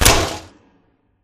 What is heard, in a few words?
Fence Flip Stop; Titanfall 2; Boomtown